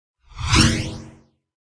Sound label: dragon red